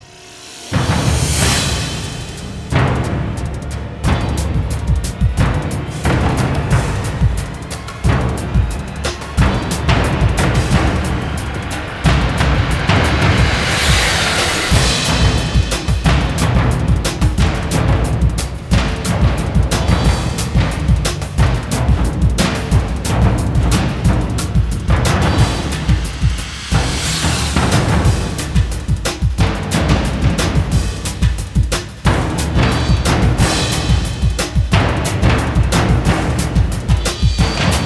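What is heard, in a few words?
Battle E minor 180 bpm; Disney Infinity 2.0 Marvel Super Heroes; Arachna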